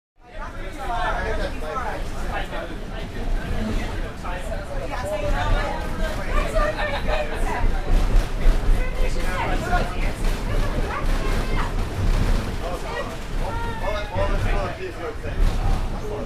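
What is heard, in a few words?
Interior Commuter Train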